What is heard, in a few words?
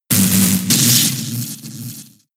Thunder Wave; attack moves; Pokemon; gen 6; XY; ORAS